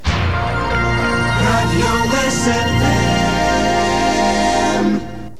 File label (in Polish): radio jingiel przed serwisem